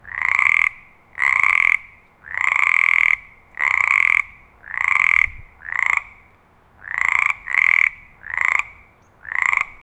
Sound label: frog croak